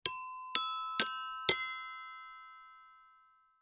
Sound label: toy glockenspiel keys hit ascending